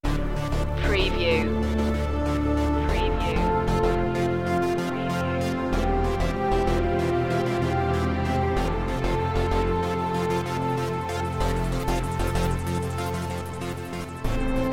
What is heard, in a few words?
elec strings no percs